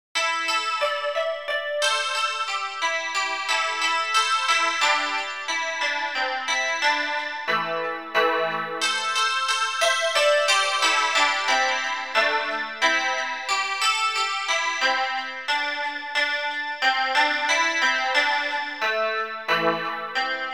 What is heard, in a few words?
koto